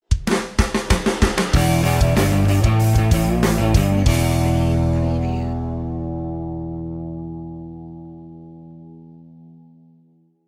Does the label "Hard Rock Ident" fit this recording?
Yes